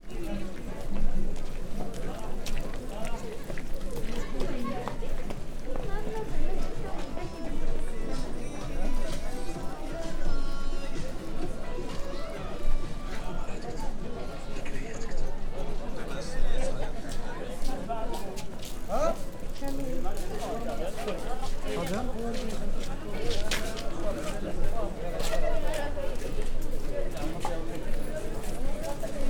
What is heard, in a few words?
essaouira walk by